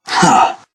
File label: light pain; zero